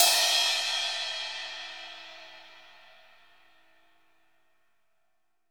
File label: RIDE, GM drum set; AKAI S-Series CD-ROM Sound Library VOL-8